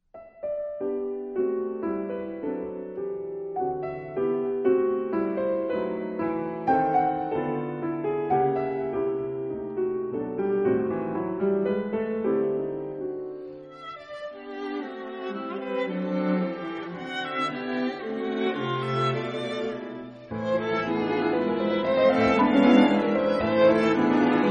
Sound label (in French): Quatuor piano